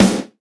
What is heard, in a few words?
Raw Snare Sample G Key, acoustic snare sample tuned to the G note; snare drum samples